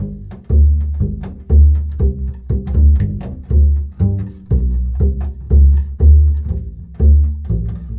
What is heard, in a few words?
Bull Fiddle, Appalachian; Continental Drift Aftershocks